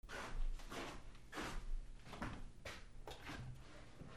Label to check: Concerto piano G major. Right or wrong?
Wrong